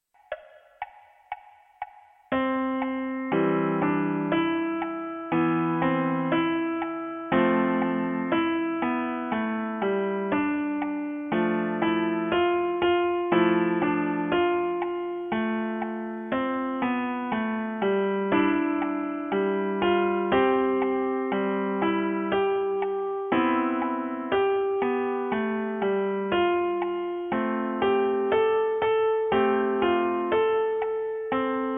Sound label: piano